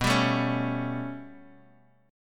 B 7th Suspended 2nd Sharp 5th down Guitar Open D, chord